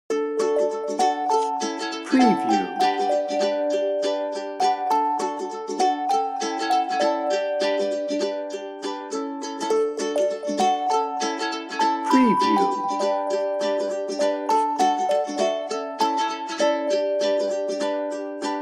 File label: sweet happy upbeat folk pop no drums